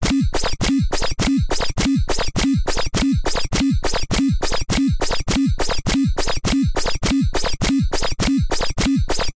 science fiction machine rhythmic operating thud pump